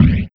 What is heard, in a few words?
Wah Kick; Sonic Foundry (Sony Creative Software); Esoterik Beatz; Wah Kit